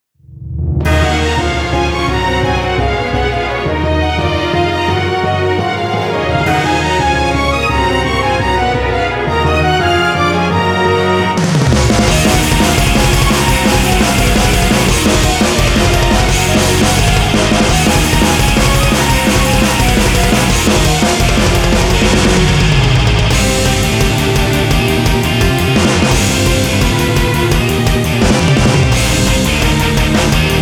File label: Orchestral; Gothic Fiction; Necro Fantasia